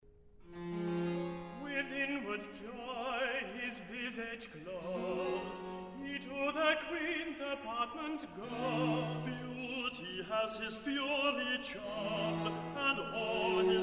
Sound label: Recitative; Handel; Esther; HWV 50A; With Inward Joy His Visage Glows